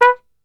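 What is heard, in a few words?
B2, flugelhorn, brass; Best Service; Brass Super Section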